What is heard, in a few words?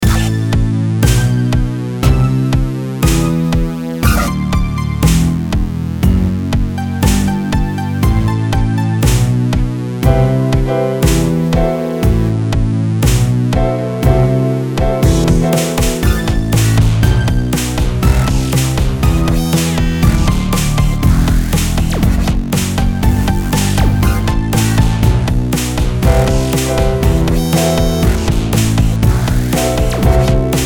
metal